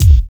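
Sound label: BD, bass drum; X-Static Goldmine 1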